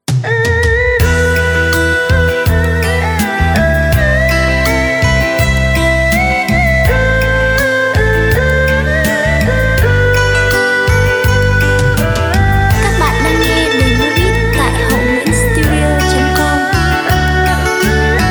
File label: beat, playback